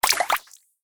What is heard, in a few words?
card game ui notification bubble; esmteam